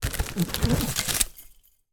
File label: foley gift present wrapped box remove from under synthetic christmas tree